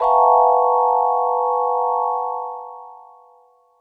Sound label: clashing vibes